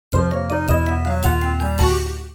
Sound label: HAPPY